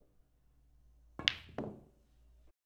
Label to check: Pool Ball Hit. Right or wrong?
Right